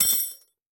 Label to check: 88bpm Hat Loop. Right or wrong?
Wrong